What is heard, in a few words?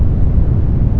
brown noise